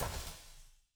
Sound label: CYMBAL, ELECTRO KIT